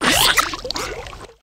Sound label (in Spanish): Grito; Quaxwell